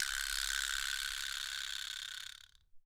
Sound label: Vibra Slp